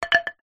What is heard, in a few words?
foley wood pole drop hard ground; zapsplat